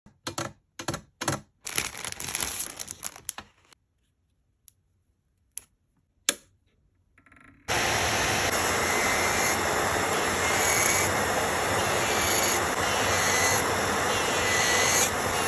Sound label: Electric nail file; سوهان برقی ناخن